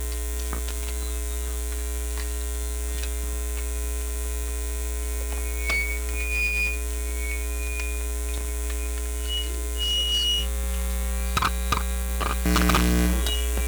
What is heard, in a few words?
amp